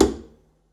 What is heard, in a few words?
pizz noise click, double bass